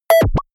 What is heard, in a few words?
sci-fi notification